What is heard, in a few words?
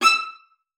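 E6, viola spiccato; Xsample VOL-5; 90 s Sample CDs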